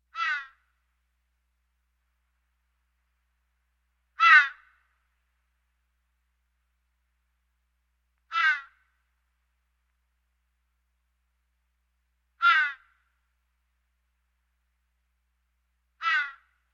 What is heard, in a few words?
Birds